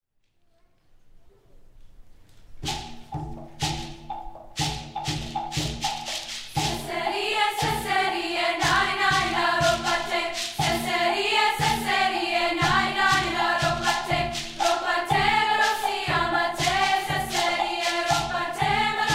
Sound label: Treble Choir; COMF 2017; charter oak music festival